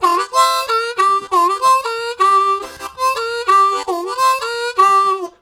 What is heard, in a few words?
Pattern, Harmonica, Riffs; Zero G